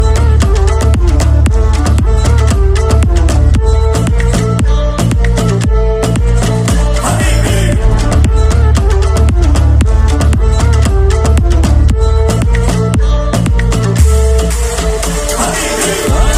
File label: arabic ringtone; islamic ringtones